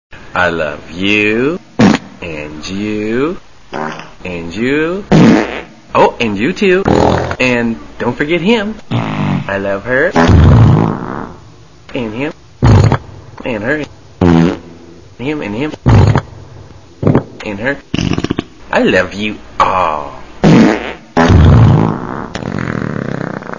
room fart

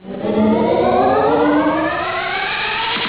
sound warp

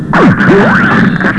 shenlong noise

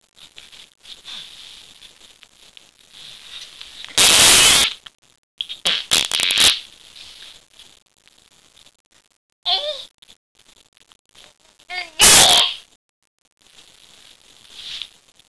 foot raspberry

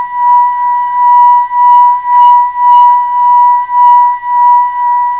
ti bowl

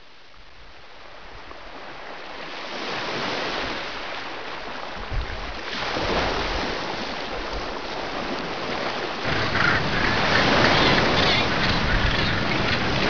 ocean

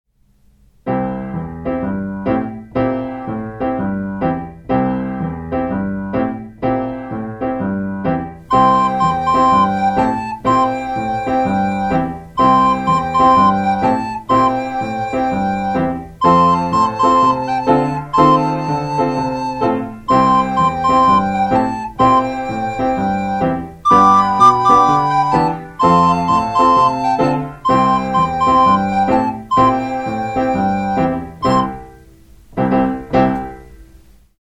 Blues

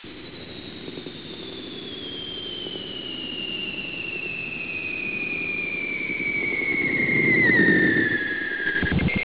alien noise

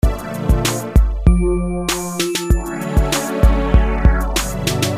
sound loop

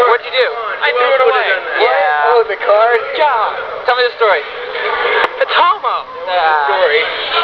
card

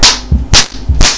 beating

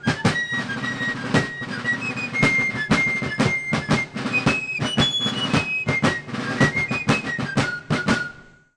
Fidle

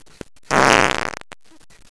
fart

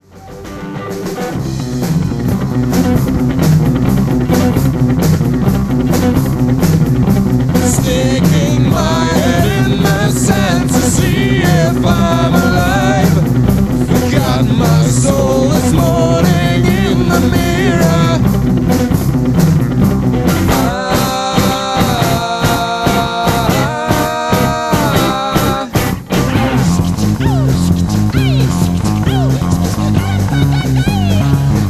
Shingle Jingle

